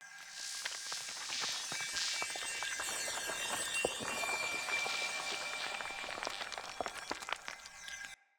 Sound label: Shock Projectile Loop